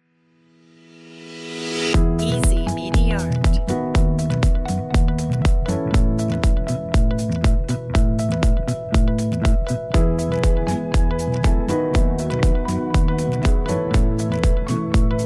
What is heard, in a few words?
corporate motivational